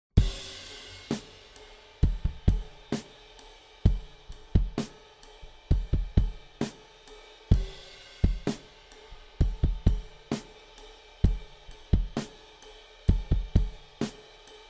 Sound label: drum replacement samples